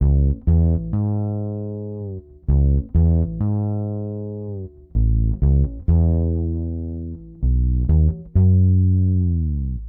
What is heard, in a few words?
Latin HipHop Fretless Bass C#Min; Real Bass; Digidesign; Pro Tools Essential Loops